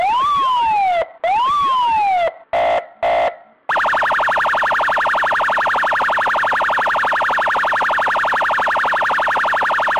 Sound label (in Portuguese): sirene da policia